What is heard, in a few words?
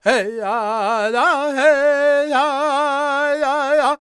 C# MIN, World Voices, LAKOTA